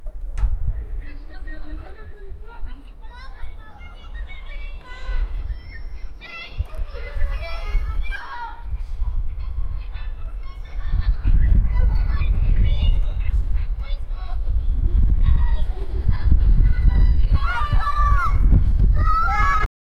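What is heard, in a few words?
schoolgirls park ambience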